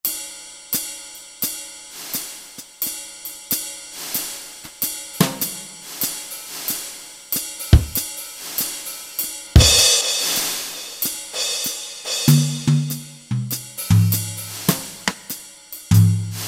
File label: BRUSH, Roland SC-880 Rhythm Sets; Factory